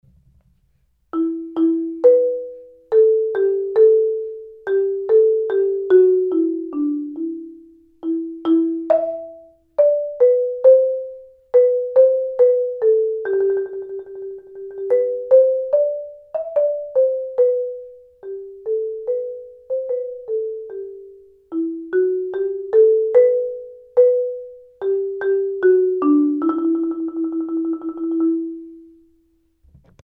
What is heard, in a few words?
mallets